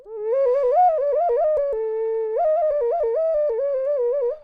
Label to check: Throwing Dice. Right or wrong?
Wrong